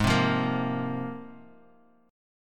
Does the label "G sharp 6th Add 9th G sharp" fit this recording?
Yes